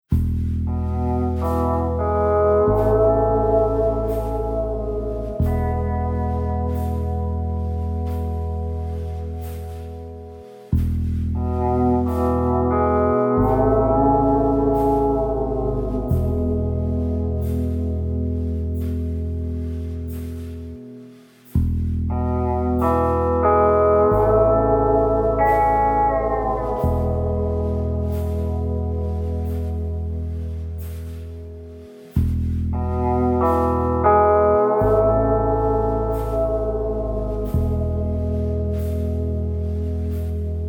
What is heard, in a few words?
Lullaby